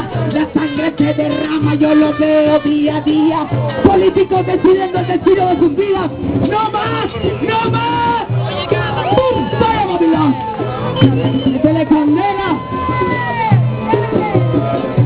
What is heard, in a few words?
reggae guy